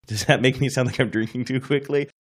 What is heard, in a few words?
make me sound like drinking too quickly